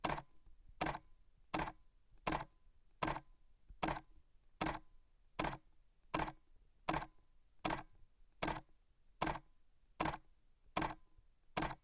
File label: Canon EOS 5Ds